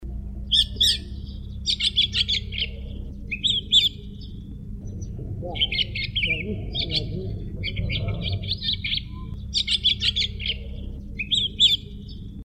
suara jalak filipina

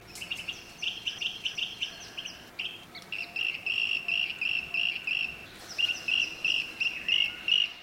kirik australia

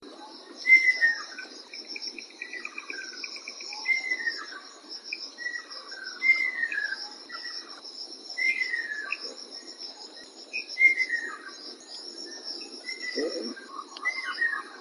cekakak merah

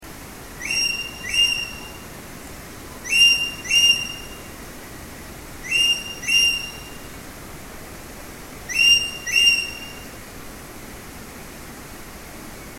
suara kicau ekek layongan

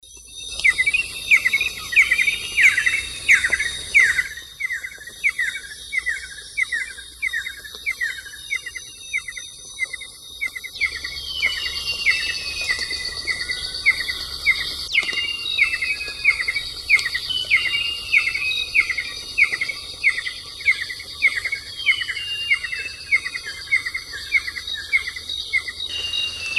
cekakak hutan melayu

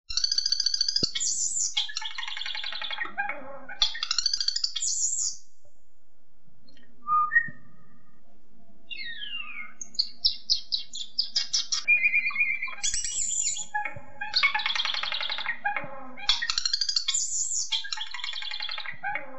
jalak eropa suara variasi

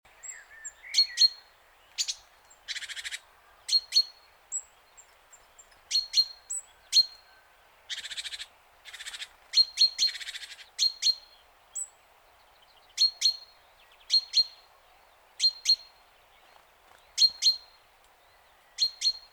suara betina glawing

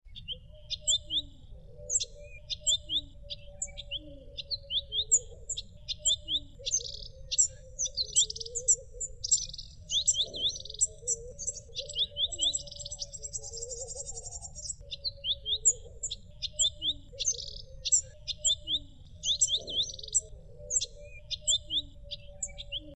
suara firefinch

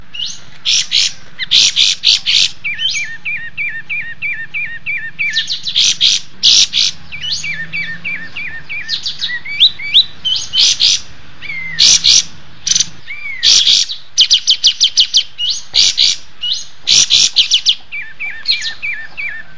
kapas tembak

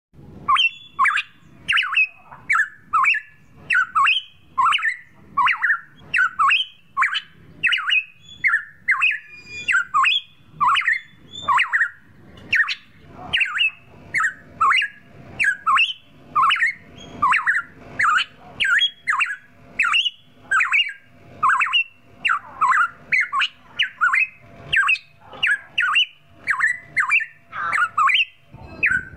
suara masteran cucak rotan

